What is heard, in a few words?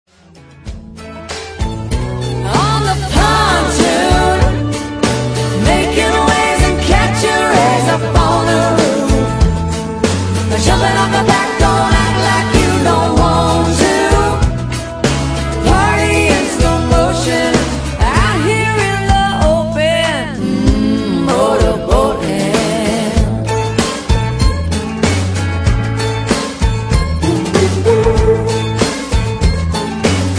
chorus